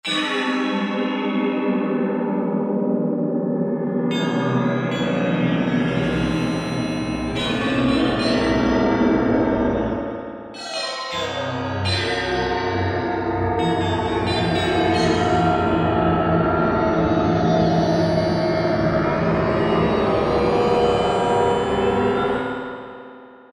Bent Metal; Factory presets

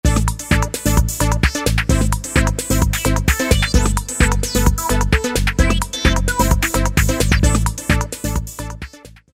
Trance, Roland D2

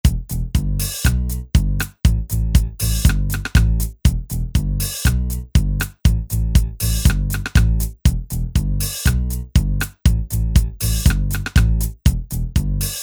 Ska

